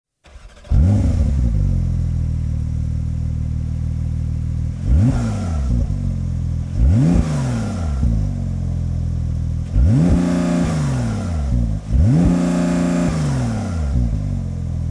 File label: Slip on Race Line Exhaust Porsche 911 Turbo 992